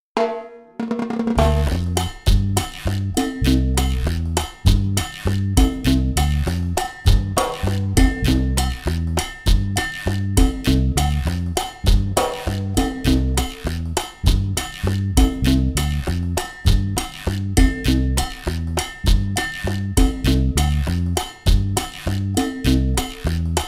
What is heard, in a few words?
Chachacha